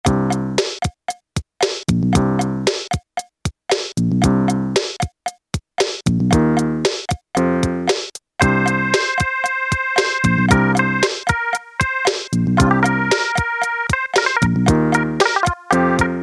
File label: ROCK 2, Casio Rapman rap patterns